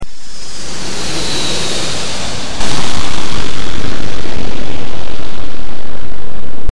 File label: Airplane